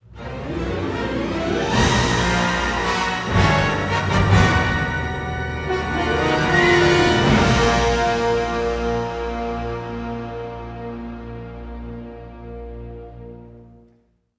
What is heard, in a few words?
Imperial Lose; Star Wars Battlefront